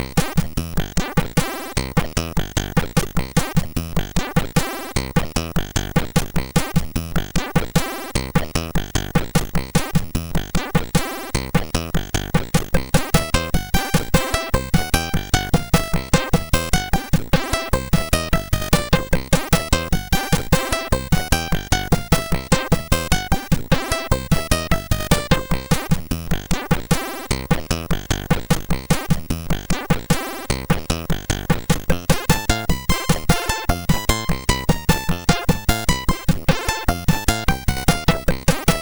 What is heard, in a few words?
sid, MOS6581R2; Total Refill